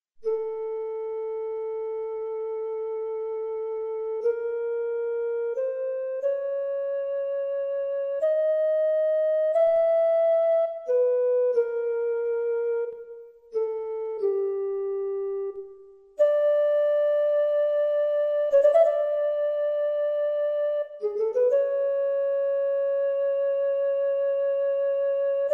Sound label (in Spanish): Misterios de la llanura flauta Version MIDI